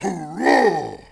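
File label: Attack Vox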